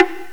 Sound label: woodblock; percussive